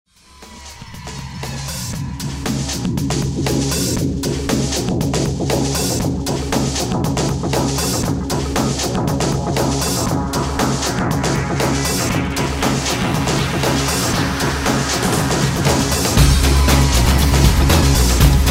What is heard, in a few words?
Breakbeat instrumental; Style: House/Breaks